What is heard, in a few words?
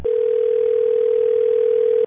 ring